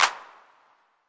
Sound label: clap, DRUMS SINGLE HITS; Claps